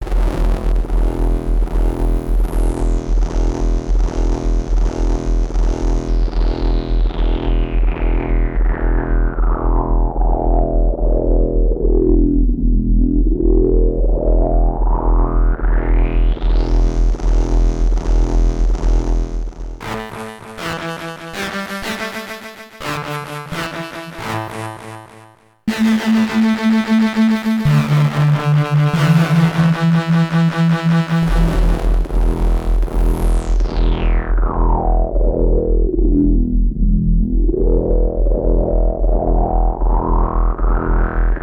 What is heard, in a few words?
ensoniq sq-80 sounds, noiser